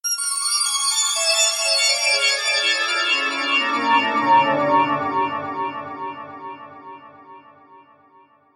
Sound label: arpeggios soft arpeggio